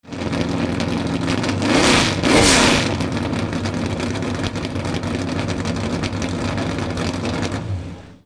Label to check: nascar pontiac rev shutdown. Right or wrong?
Right